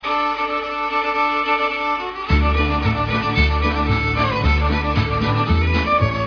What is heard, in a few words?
Ragtime